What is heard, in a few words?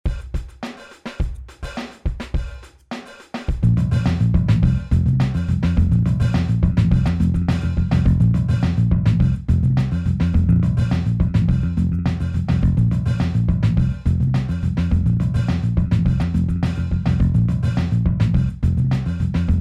Beat Bass Laidback Ahead